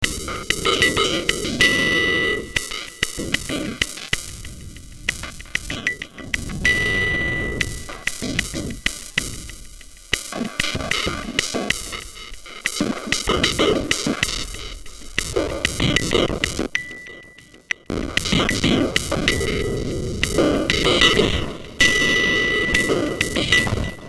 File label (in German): Frickel Elektronik, spectralis